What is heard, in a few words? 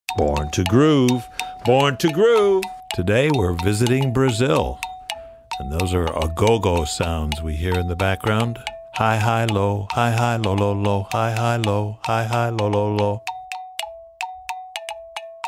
Agogo Bells